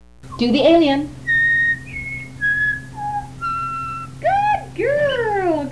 alien

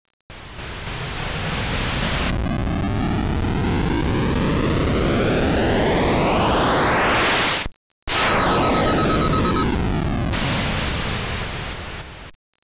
warp; star raiders

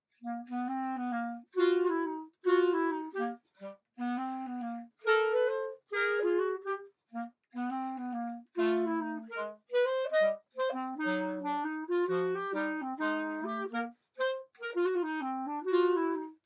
dance suite coasting, clarinet

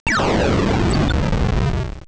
death; star raiders

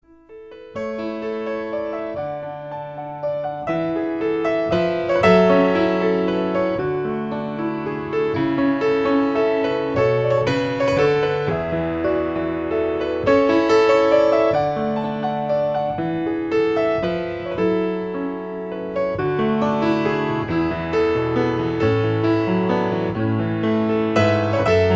Piano Reprise

